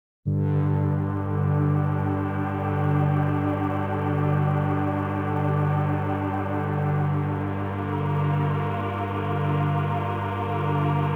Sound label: Day Amb Stinger, gamerip; dying light